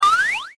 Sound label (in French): Sifflet